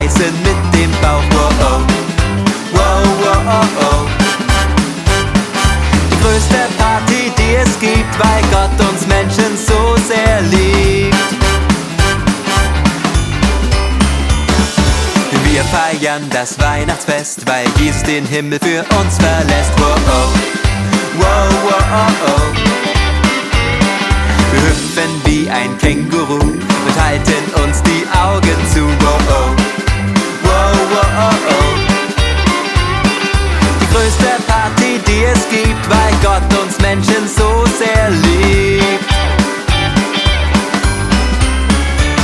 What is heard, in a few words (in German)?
Weihnachtsparty Rock n Roll